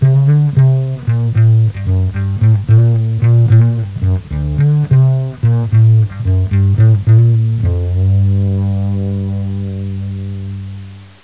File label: bass